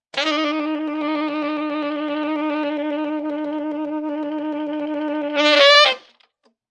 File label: A crazy saxophone riff.; Horn Sound Effects; Musical